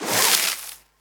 multiline net; DinoReels81WD; wazdan

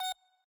gambling mouseover bar; BARs 7s; wazdan

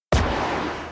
bonus cars hit; Crazy Cars; wazdan

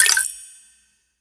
ultrafast gambler win; DragonsLucky8WD; wazdan

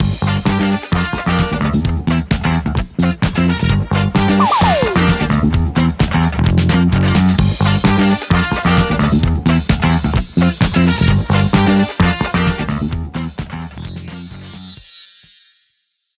super win; Back to 70s; wazdan

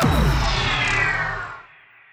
gambling lose; BARs 7s; wazdan

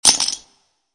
chip raise, chips; Caribbean Beach Poker; wazdan

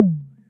reel stop; Back to 70s; wazdan

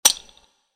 chip cast, chips; Caribbean Beach Poker; wazdan

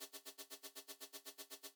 reels rotate; DoubleTigersWD; wazdan

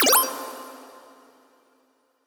win line; Beauty Fruity; wazdan

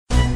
mystery short; Criss Cross 81; wazdan

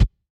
reels stop; DraculasCastleWD; wazdan

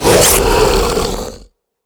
win normal; DoubleTigersWD; wazdan

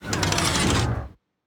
gambling reels out; BARs 7s; wazdan

